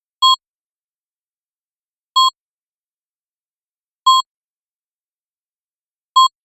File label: Heartbeat